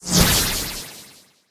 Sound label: wind, battle